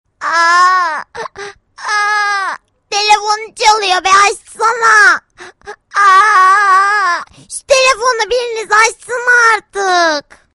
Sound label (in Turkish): Aglayan Bebek